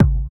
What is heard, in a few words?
THROAT; Spectrasonics; Metamorphosis; THROATERS